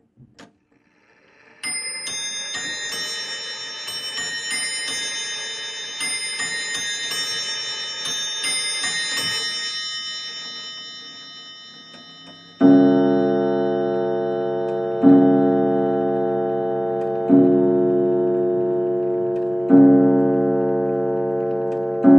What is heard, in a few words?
CLOCKS MANTLE CLOCK: INT: 1880 s large mantle clock strikes 12 0`clock